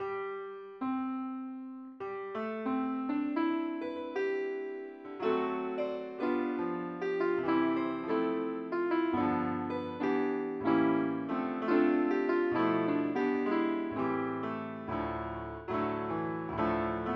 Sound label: piano